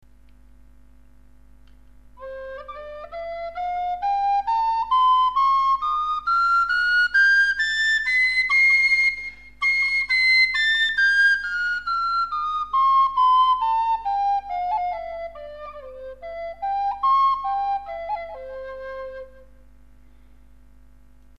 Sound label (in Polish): flet sopran